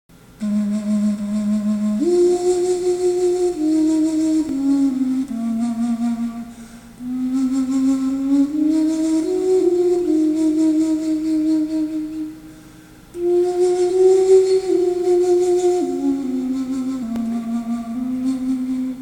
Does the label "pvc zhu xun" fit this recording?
Yes